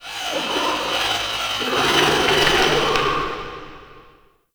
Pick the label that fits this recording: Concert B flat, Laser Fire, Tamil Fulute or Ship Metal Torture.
Ship Metal Torture